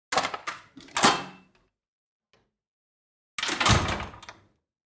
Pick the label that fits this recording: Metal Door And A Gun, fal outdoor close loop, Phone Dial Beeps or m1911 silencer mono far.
Metal Door And A Gun